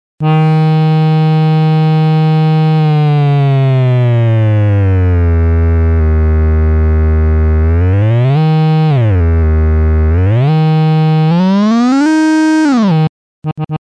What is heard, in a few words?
pitch sampler